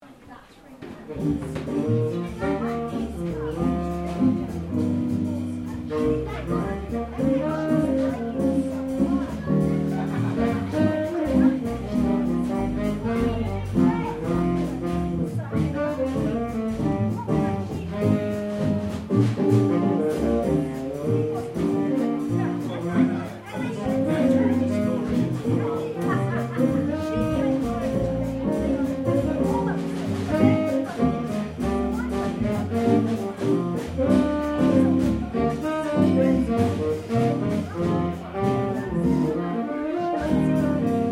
Ambience Party Outdoors Live Jazz, Outdoor party with music; Concerts (Sound Effects:Backgrounds)